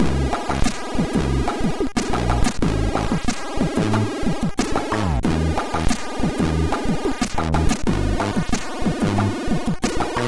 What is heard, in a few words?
Pod Jams, sid MOS6581R2